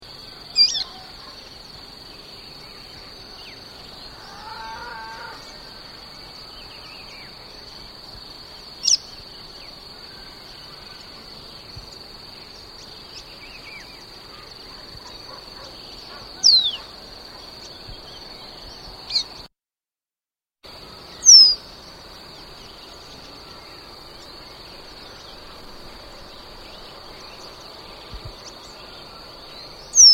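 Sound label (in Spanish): capuchino café, Capuchino Garganta Café, Sporophila ruficollis, Dark-throated seedeater; cantos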